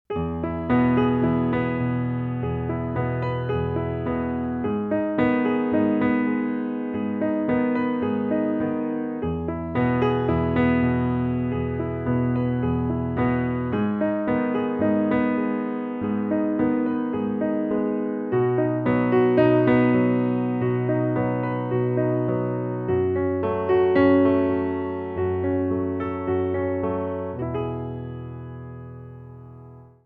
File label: solo piano